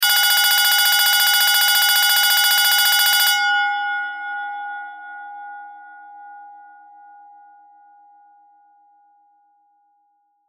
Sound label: Bell Race Horse, Bell Race Horse Race Start Bell Ring; Miscellaneous Sport